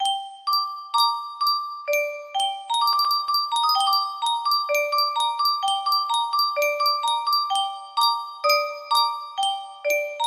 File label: yunsheng music box, music box melody